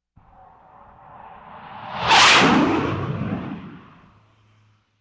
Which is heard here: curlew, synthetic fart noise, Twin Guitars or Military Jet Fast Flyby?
Military Jet Fast Flyby